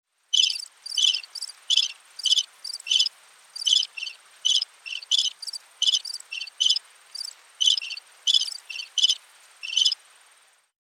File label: Crickets; RØDE